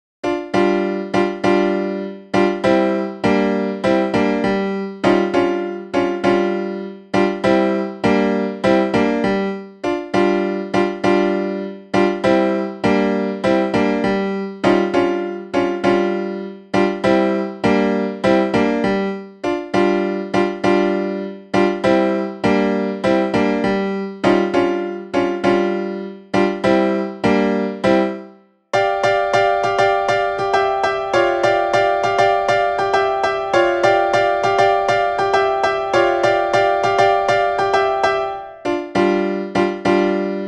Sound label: Gm Rock Groove; admiralbob77